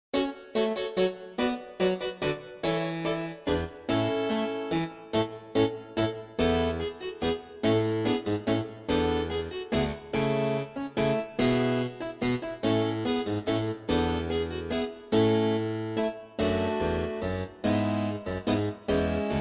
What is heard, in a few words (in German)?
nur Begleitung